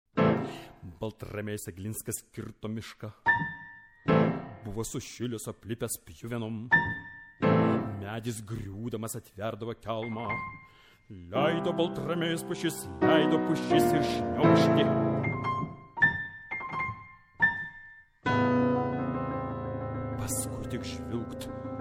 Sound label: vocal cycle Breathing I, tenor